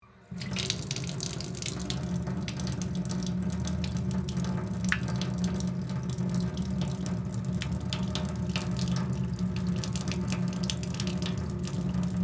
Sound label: Utility Sink Run, Industry, Utility, Sink, On, Run, Off, Faucet, Metal, Basin, Slow, Long; Bathroom Sound Effects; BlastWave